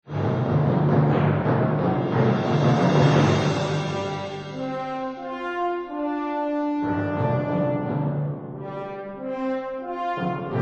Festival Fanfare

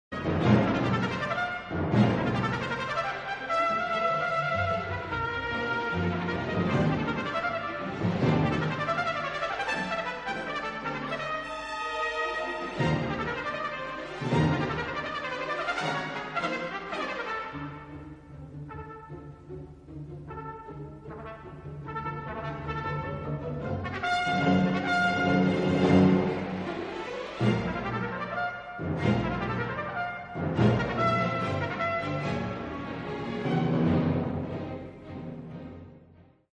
Trumpet Concerto; 3rd. Movement

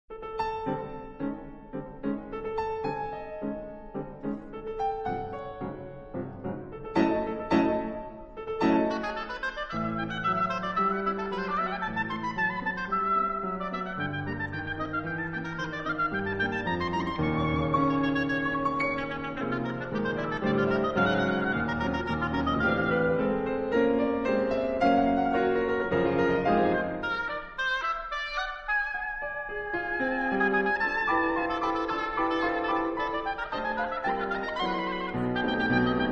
Oboe Sonata; 2nd Excerpt

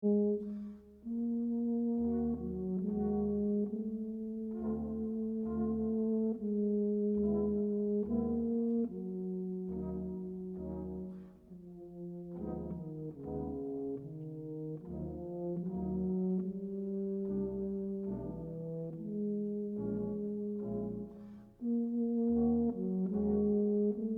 Concerto For Tuba Brass Band Lento E Mesto

